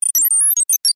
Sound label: science fiction computer beep tone response